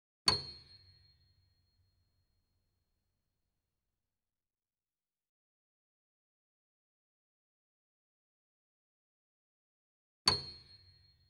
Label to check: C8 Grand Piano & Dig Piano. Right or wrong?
Right